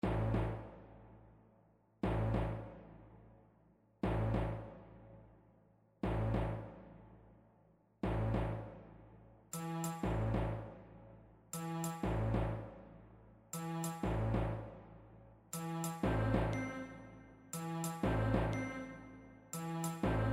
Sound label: Sinfonia; Big Noise 2025